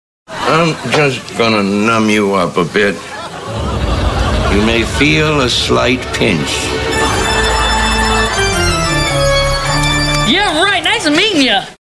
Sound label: pinch